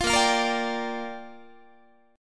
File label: A sharp 6th, guitar chord; strummed; chordshape; 20,18,20,20,18,18